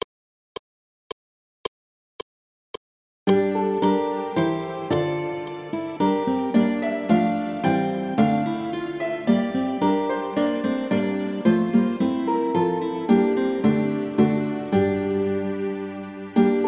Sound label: Galliarde; Phalese; L esmerillonne